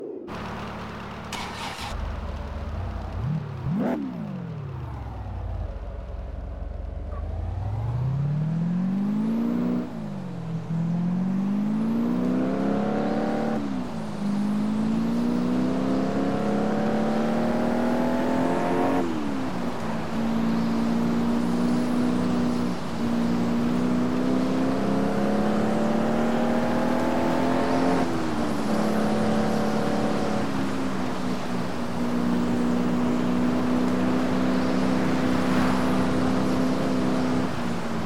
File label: cadillac cts-v; TDU-1